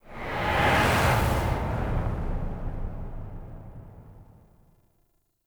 Ship By; Warframe; The New War; Zariman; Apartment; Scene Six

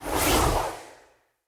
Staff Caster Cast Heavy; Weapons; Staves; Starter Staff Caster

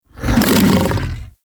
Vocal Attack Short; Warframe; Predasite; Hunting Critters; Characters

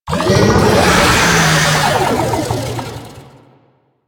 Attack, Vocals; Proto Infested Babau

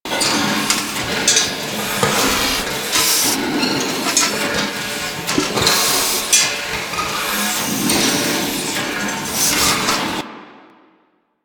Infested Air Pipe Loop; Warframe; Ambience; NineteenNinetyNine

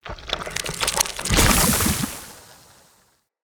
Corrupted Sproutfolk Summon Burst; Enemy; Combat

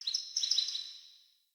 Bird Song; Paragon Bird

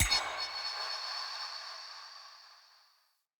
Sword Recall Button Push; Weapons; Player; Swords; Fenn Jotar Sword

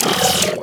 Servo Down; Ambience; PVP; Props; Warframe; CTF Cephalon

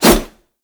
Heavy Whoosh, Dual Daggers; Prime Okina

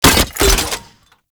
Pistol Alt Fire Mech; Weapons; Scaldra

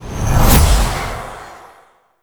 Zenurik Wellspring Cast; Warframe; Focus

